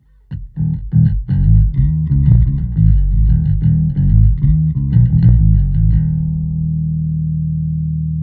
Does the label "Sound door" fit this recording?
No